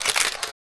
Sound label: camera shutter classic